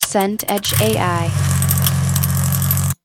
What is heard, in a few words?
car start